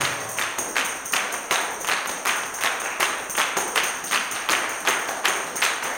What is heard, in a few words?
160 TAMB BRK; Blues & Soul